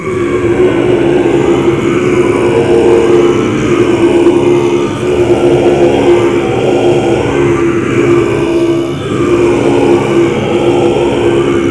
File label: litania